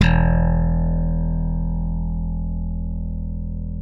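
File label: left thumb E1, slap thumb; Xsample VOL-1; 90 s Sample CDs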